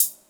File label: sparkly hi hat closed sound D key